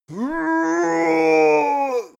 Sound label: male die growl